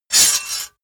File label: sword cut hard light fast